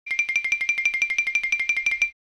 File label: Cartoon tiny walk